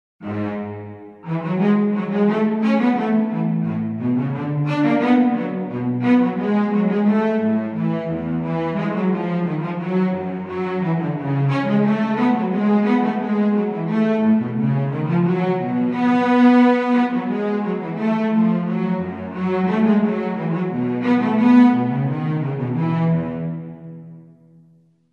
Instrumental; Bach; St John Passion

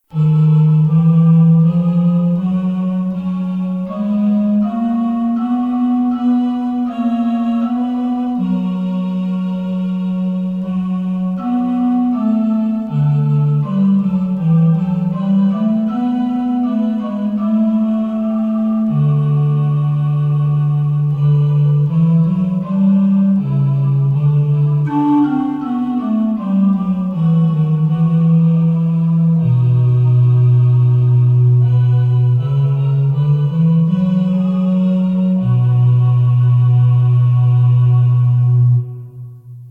Instrumental

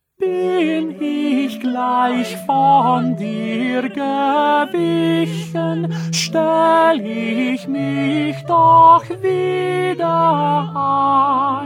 Tenor; Bach; St Matthew Passion

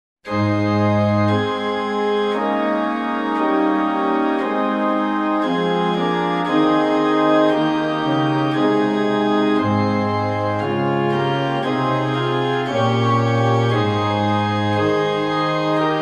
Instrumental

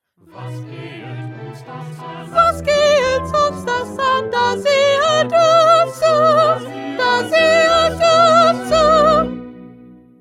1st Soprano

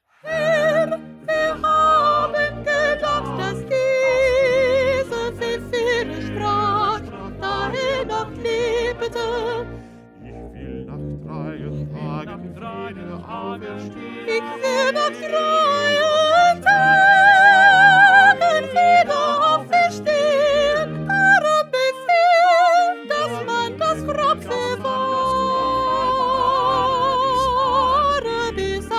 2nd Soprano

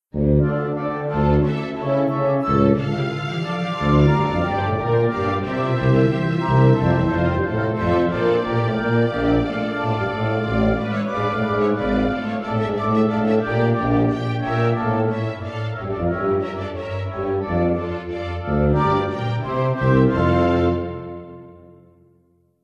Instrumental; Bach; St Matthew Passion

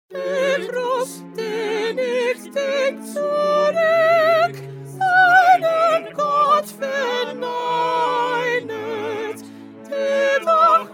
Soprano; Bach; St John Passion

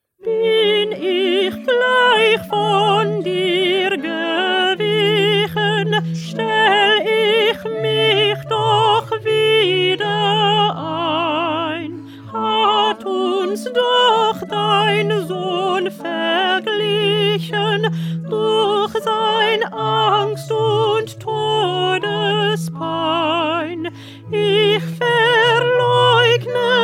Alto; Bach; St Matthew Passion

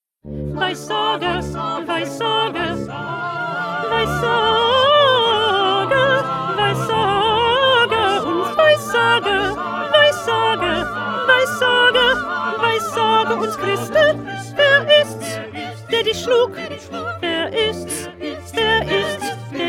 1st Alto; Bach-St-Matthew-Passion-42b

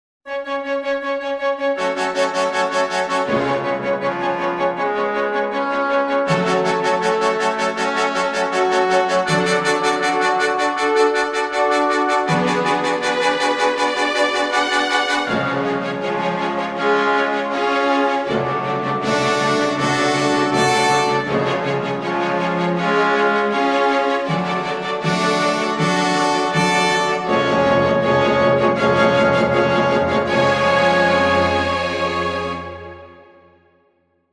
Instrumental; Mendelssohn; Elijah

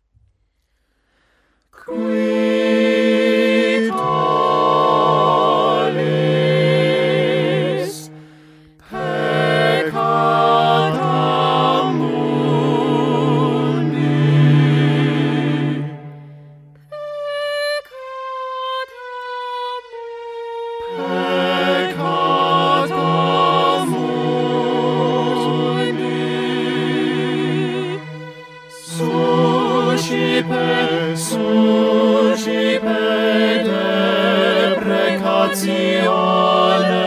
Concert Version; vivaldi; gloria

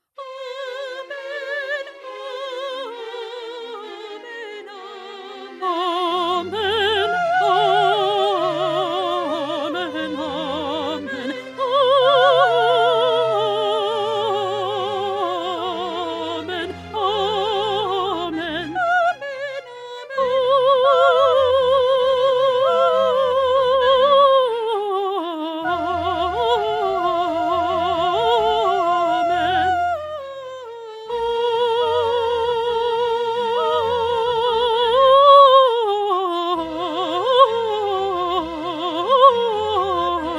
Alto; Pergolesi; Stabat Mater

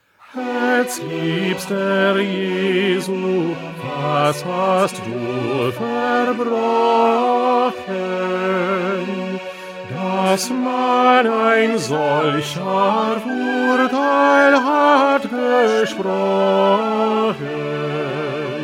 Bass; Bach; St Matthew Passion

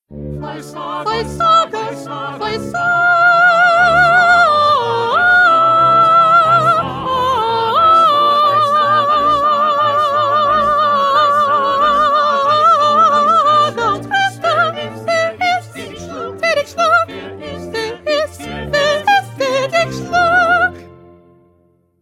2nd Soprano

